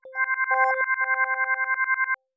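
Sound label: granular kalimba accent C major; accents; bespoke - Floral v.2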